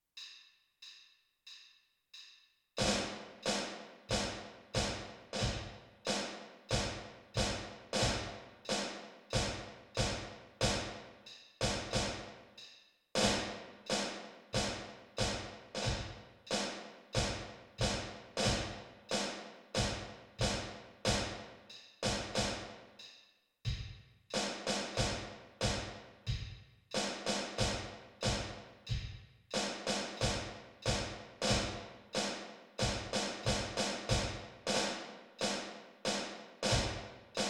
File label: snare drum bass drum; theme from symphony no. 9